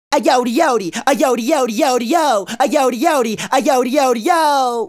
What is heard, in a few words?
Male Solo, Vocal; Sonic Foundry (Sony Creative Software); Vocal Toolbox